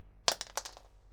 Pen Drop On Floor

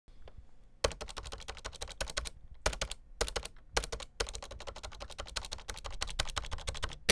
Keyboard Backspaces

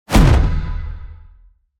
Impact; Sound Design Elements

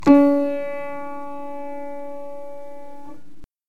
Piano

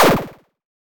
Laser

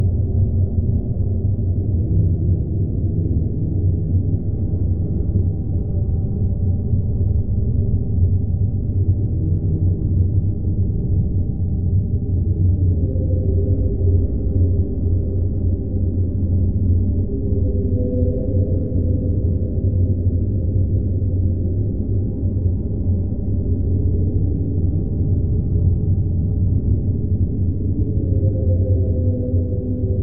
Soundscape Cavern

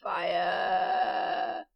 Fire

